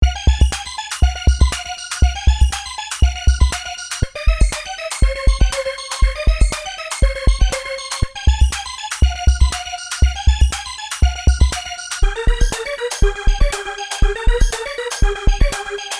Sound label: ARP Music Box